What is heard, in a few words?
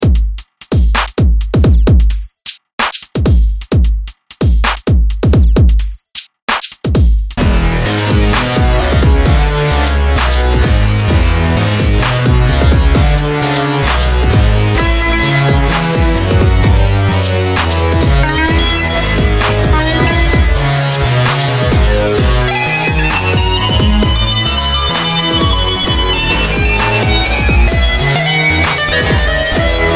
MIDI blues; spellman studio